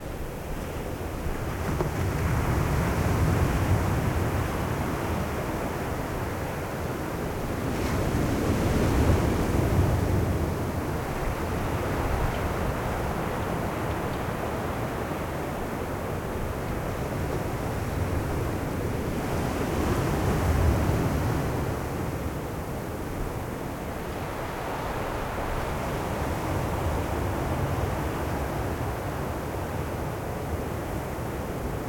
ambience wind base; smite; conquest

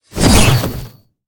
swing; smite; heimdallr

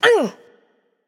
grunt hit; smite; pele

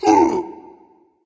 grunt hit dragon; fafnir

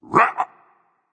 grunt jump berserk; cuchulainn

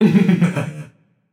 heh heh, voicepack; smite; jorm

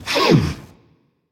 notice; smite; voicepack; medusa

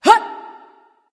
grunt jump; smite; ratatoskr

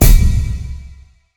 death fall; smite; god daji skin15

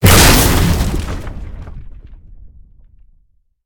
intro impact; argus; smite; hera

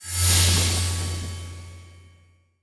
light heal; smite; khepri

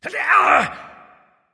grunt hit; smite; ares

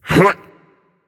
nv grunt lift; cernunnos

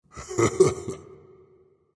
heh heh, voice; Smite; ymir skin6

